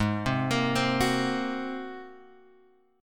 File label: G sharp 9th G sharp Guitar Open G